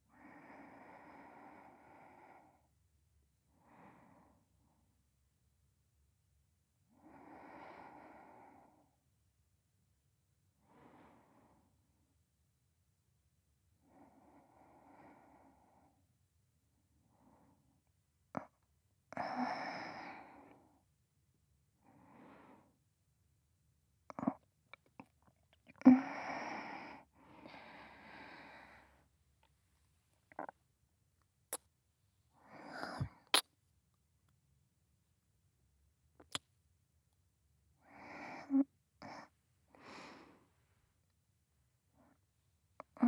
添い寝寝息ボイス

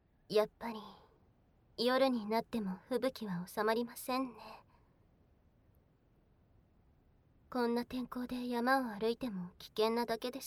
耳舐め; 甘々ちん媚び耳舐め交尾

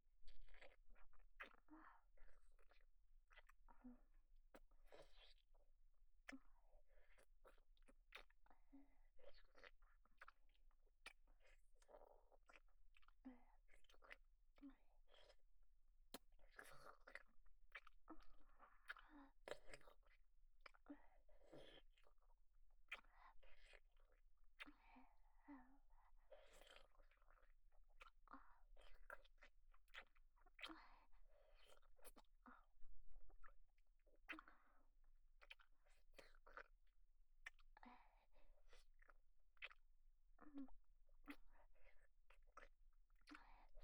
左寄り フェラ 強め

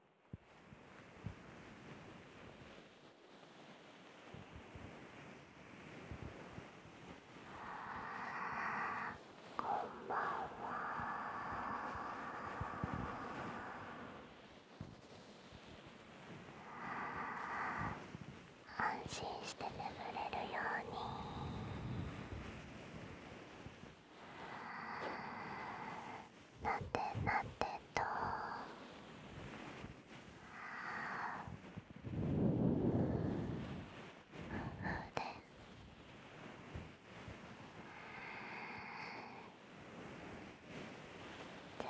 耳ふーしながら優しくなでなで, ASMR

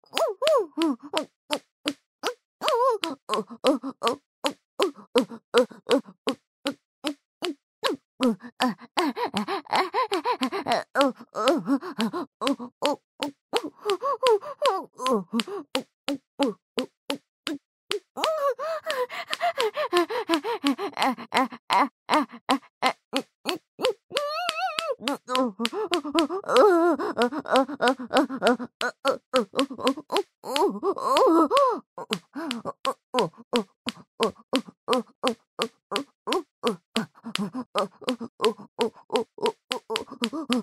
オホ喘ぎ; おまけループ（オナサポ）; 喘ぎ声; SE有

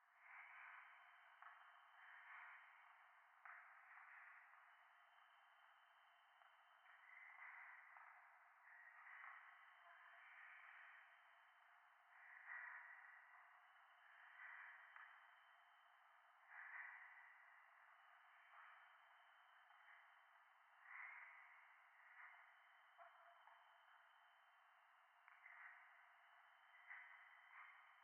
廃墟 左耳密着吐息 山奥

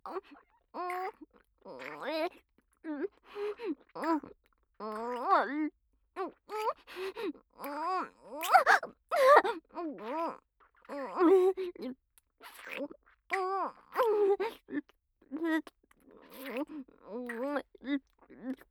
キス

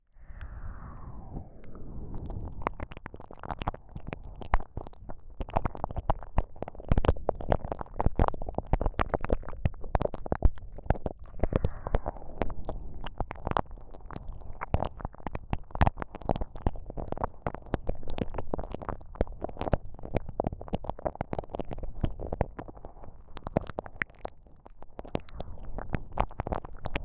KU100 両耳同時 最奥舐め

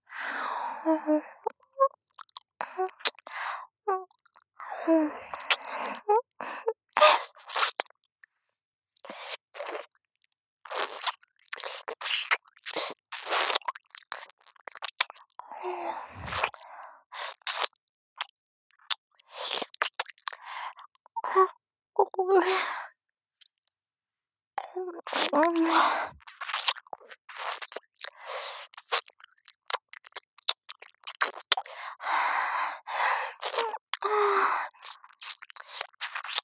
あまとろ王女の右耳舐め, ASMR; ループ; SE有り

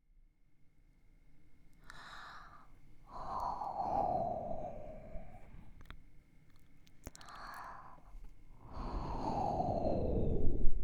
はむはむ 右

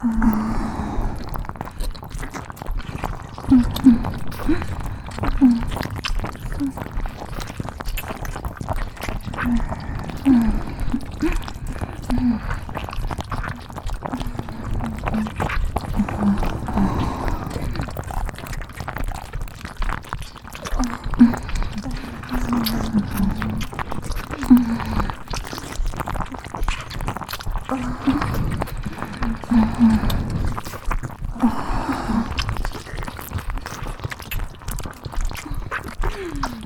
キス・両耳舐め・両乳首舐め・フェラチオ搾精

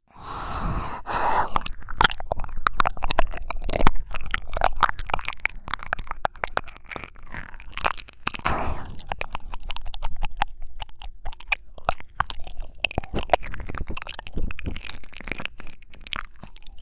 耳舐め; お誕生日プレゼントは年下彼女と朝まで濃厚エッチ

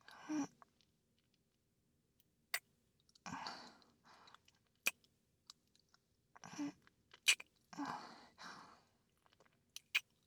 キス; SEなし

